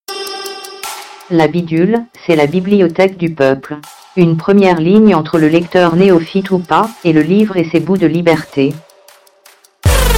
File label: Jingle; Bidulus